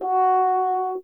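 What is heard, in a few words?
brass French horn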